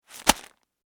Food Bread Wrapper Set Down Loaf; Household

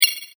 multimedia rollover, Multimedia Rollover Tone

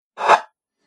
Rough Swipe, whoosh

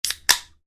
Open Can Of Beer; Kitchen; Household

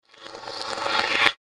Creepy Alien Beetle Bugs

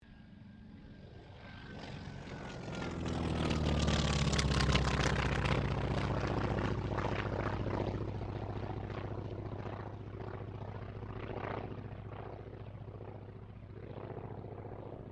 AIRCRAFT T-28D TROJAN PASS; Airplane; Airplanes; Vehicles

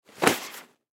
Hockey Pants Drop Cement; Blastwave

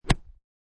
Golf Miss Hit; Sports; Fresh air stroke. Miss ball, swipe grass; Lynne Music

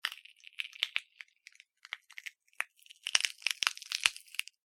horror bone crush; Bone Being Crushed; Breaking; Bone Crushing; Objects

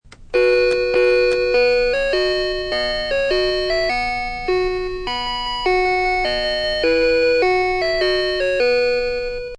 "Row Your Boat Melody" played by a toy; Children; Human

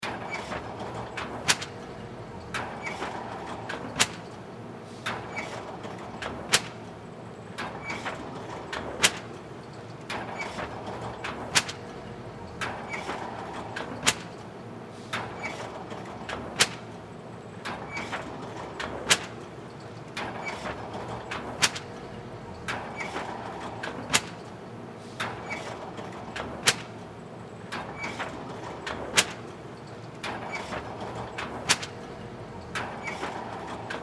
Printing Press Machine Sheet Master Paper Loop; Factory; Industry

